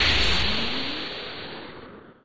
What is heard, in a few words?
Missile Launch; Missile Launch 2